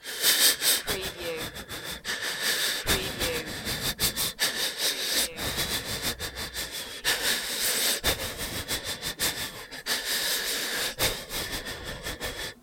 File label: Scared Panicky Breathing - moderate speed